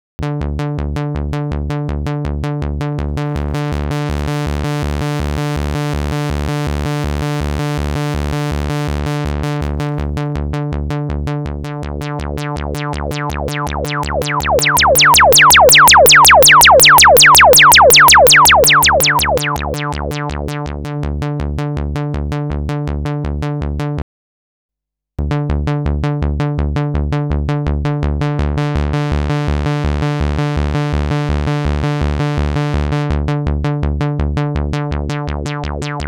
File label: MonoPoly Sequence